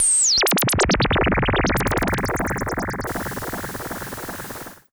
SH-09 Delay

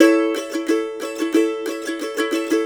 COUNTRY

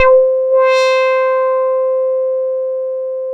C5, bass; Best Service Dream Experience

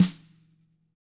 HIT, TAMA SNRD, Drums; ILIO; Double Platinum Drums 2

